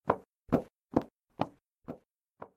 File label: footsteps